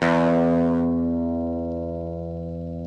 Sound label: guitar one note fuzzy